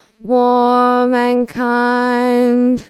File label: singing